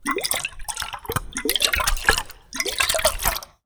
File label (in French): gargouillis